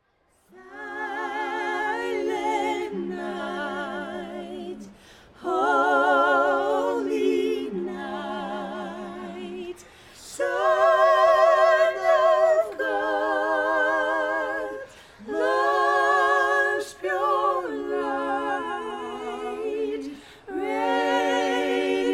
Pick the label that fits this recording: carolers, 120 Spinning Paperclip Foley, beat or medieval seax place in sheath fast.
carolers